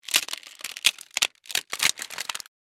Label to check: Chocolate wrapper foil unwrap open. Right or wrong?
Right